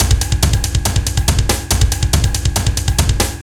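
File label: drum loops, Right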